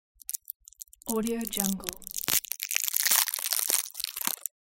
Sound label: candy wrapper